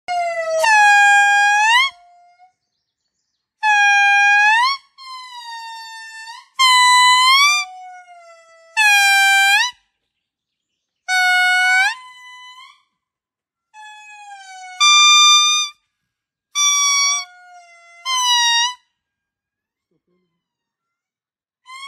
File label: Lemurs make bizarre sounds, halka kuyruklu lemur